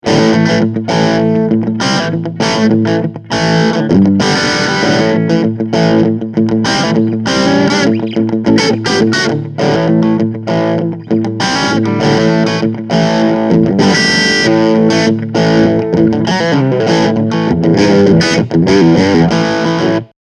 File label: Crunch Riff